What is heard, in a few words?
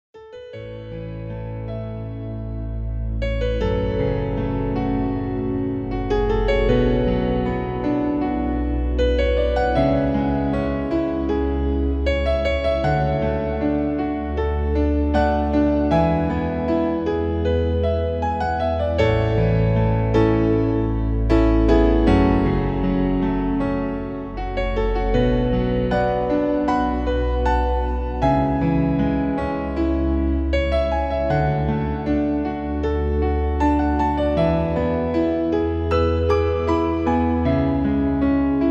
Romantic Piano; Take me home